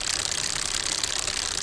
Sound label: bmx pedal loop; terrains; Tony Hawk's Project 8; XBOX